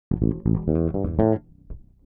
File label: DEEPHOUSE BASS 125 A